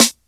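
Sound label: march snare; Drums In Your FACE!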